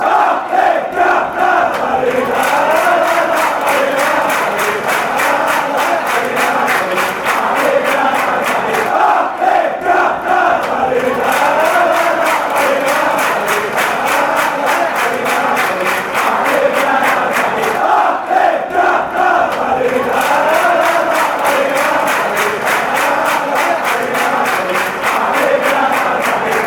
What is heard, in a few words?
grana fanchants